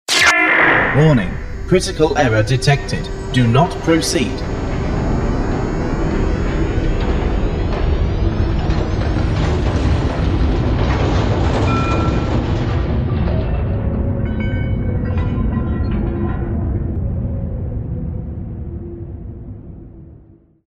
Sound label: Gates Close